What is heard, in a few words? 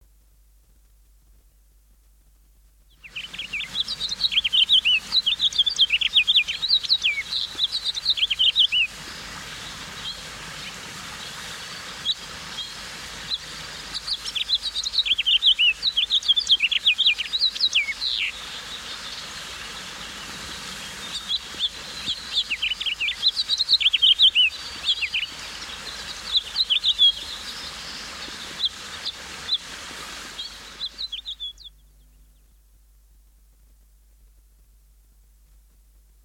house finch, songbirds